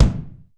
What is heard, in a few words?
BD, DRUMS; East West; Global Sounds; RY30